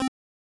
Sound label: scroll; RPG Maker; The Huntress of the Hollow v1.2